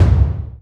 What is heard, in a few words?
run; Dota 2; earthshaker